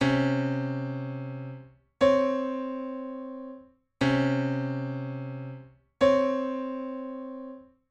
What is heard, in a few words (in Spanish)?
Intervalo armonico novena menor